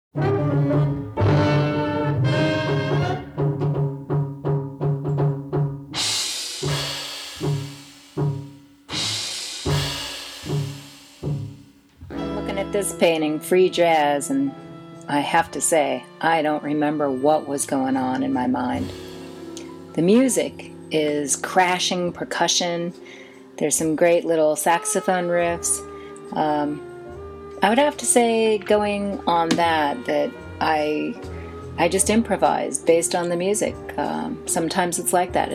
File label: Free Jazz